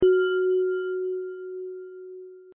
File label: bell